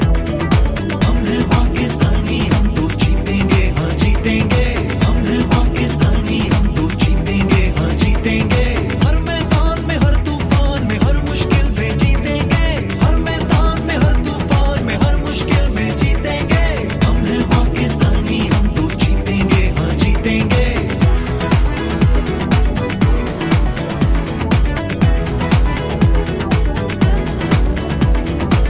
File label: Remix Ringtone